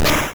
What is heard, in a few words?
Damage